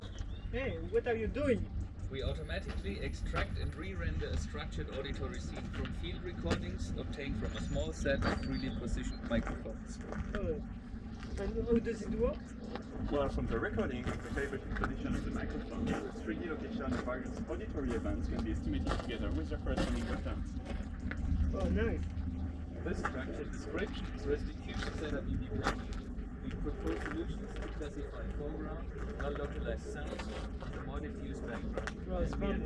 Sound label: Outside